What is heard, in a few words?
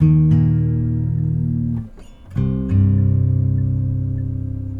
Guitar Only; Sonic Foundry (Sony Creative Software); Friendly Fire